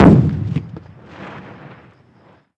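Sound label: explosion medium; ModernWarfare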